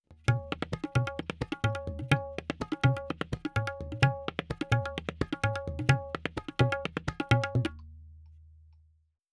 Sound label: Tabla C 4-4 128, Percussion; Sample Banks